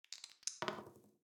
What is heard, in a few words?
easteregg dice; goldentavern